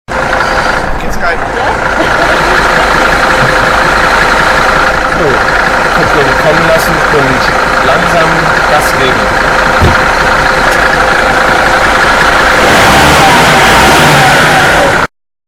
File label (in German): fahrschule